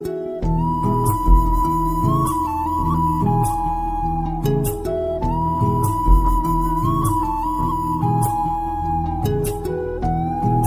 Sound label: Emotional love Tone